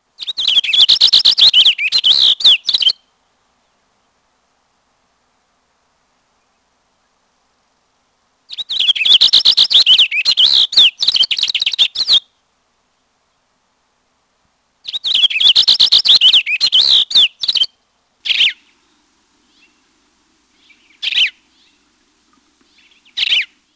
cassins finch

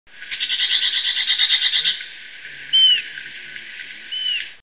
smoky brown woodpecker

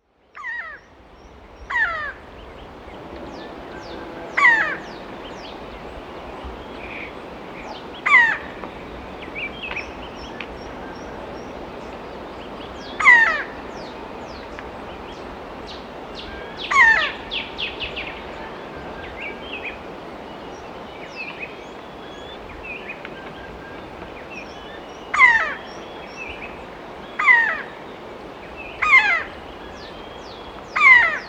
powerful woodpecker

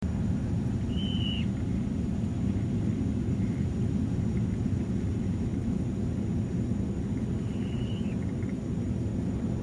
rufous chested plover

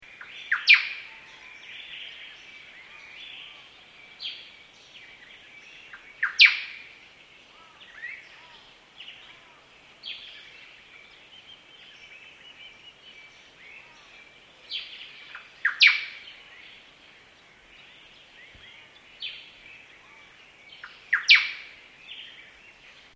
white-browed robin

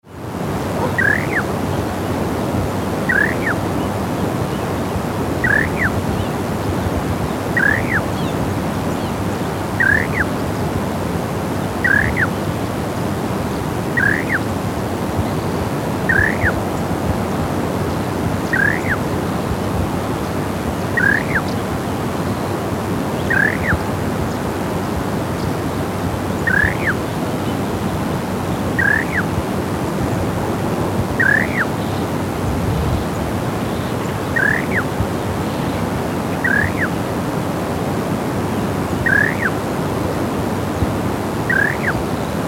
black winged ground dove

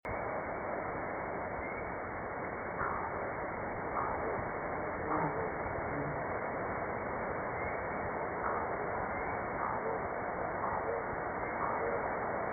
tawny tufted toucanet

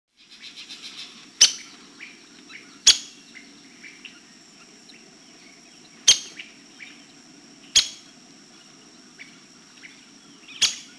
ladder backed woodpecker

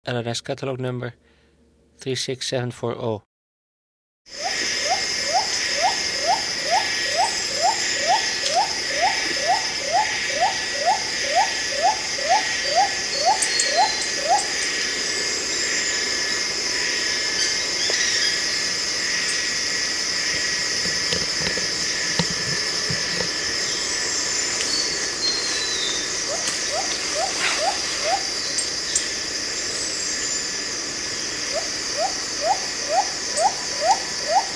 andaman cuckoo dove

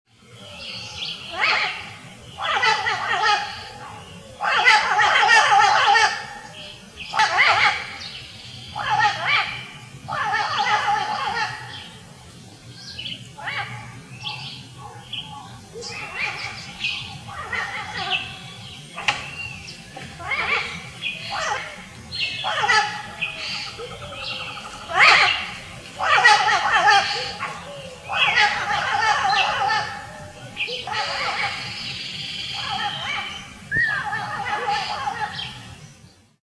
yellow naped parrot

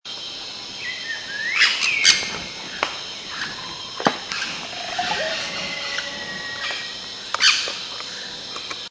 crimson bellied parakeet

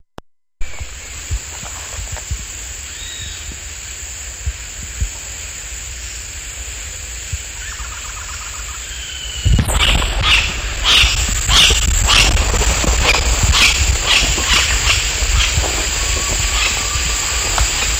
rufous headed woodpecker

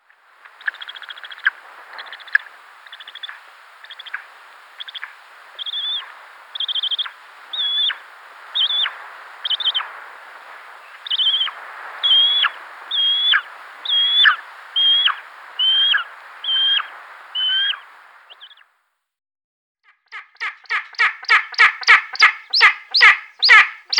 spanish imperial eagle

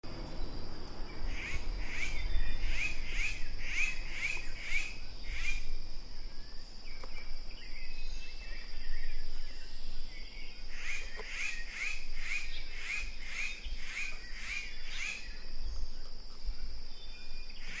azure hooded jay

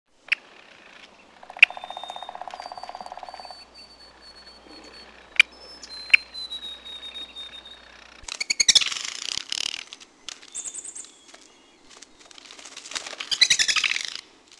black backed woodpecker

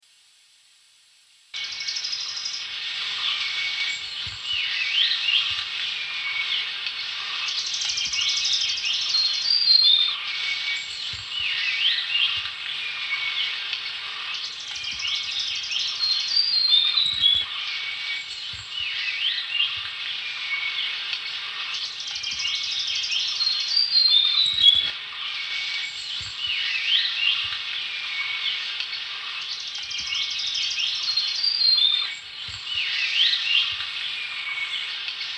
yellow billed nuthatch

